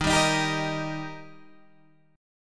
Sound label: D sharp Major